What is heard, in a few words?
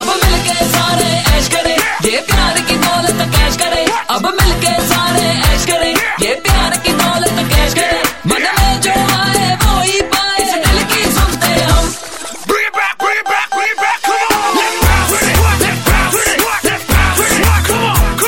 Ringtone, Punjabi